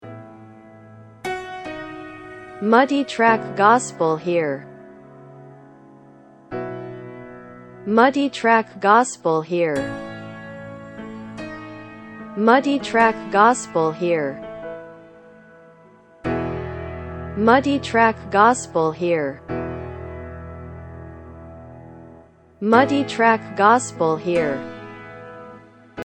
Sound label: Keys Piano